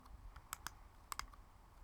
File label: machine; 左クリック音